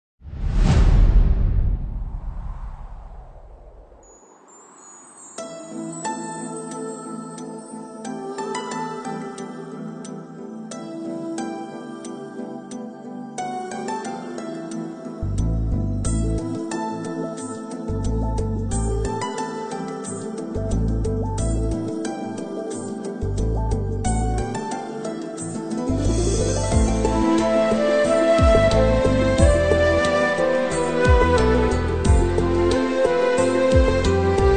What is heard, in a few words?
jesus ringtones emotional bgm music phone ringtone christian ringtones